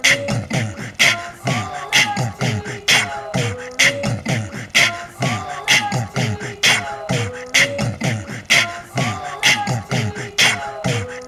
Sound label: loop